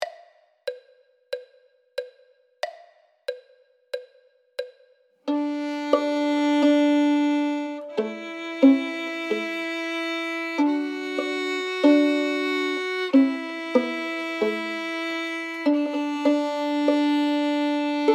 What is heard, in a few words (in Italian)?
violino I e II